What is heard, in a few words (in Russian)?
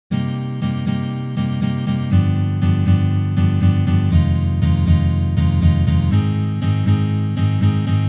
Uprazhneniya akkord G7